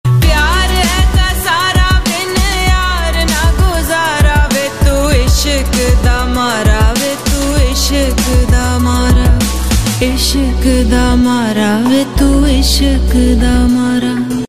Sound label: serial drama ringtone; akhada